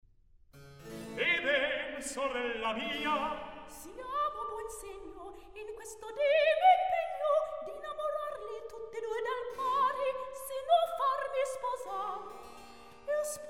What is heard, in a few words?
Recitativo; Eh ben sorella mia; Mozart; La Finta Semplice